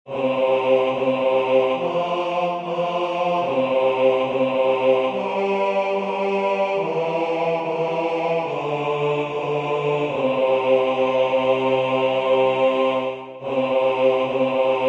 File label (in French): Priere des freres moraves basse